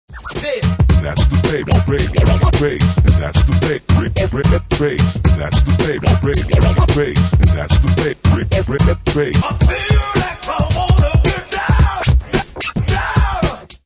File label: Beatbox The Scratches